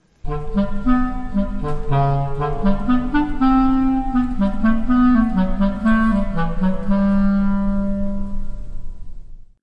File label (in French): chat clarinette